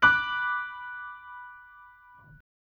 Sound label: Bm higher, chords